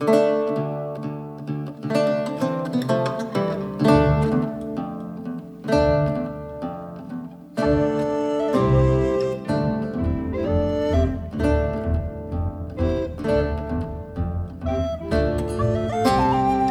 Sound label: UI music; gamerip; Hearthstone